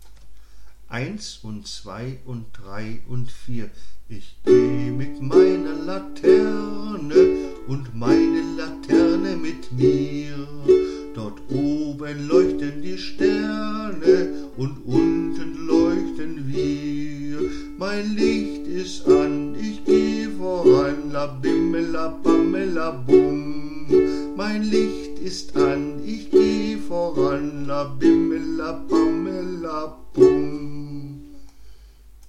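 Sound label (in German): Ich geh mit meiner Laterne Akkorde - Nur Abschlag mit Gesang, gitaar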